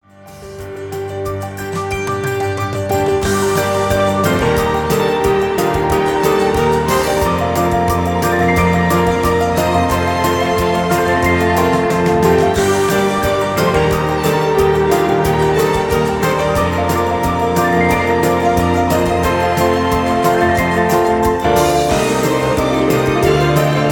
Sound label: Rock Version; God Rest Ye Merry, Gentlemen